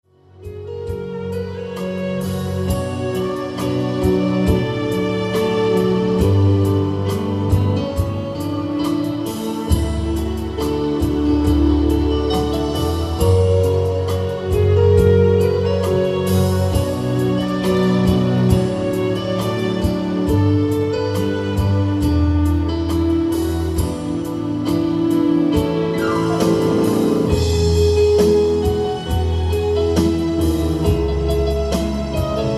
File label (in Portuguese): Piano e Cordas